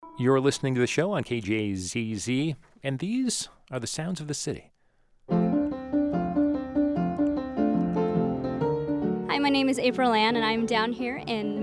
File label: piano